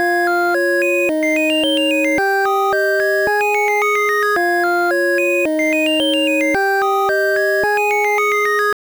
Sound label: 8bit